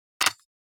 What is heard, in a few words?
Ringing Katana Tsuba